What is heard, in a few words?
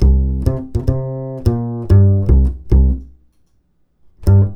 105 NuJazz Bass C maj; Pro Tools Essential Loops; Digidesign